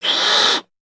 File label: Pet Cat Angry; creatures pets; Lego